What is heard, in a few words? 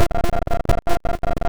beat